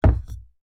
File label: Put down the wooden cup; alcoholic drink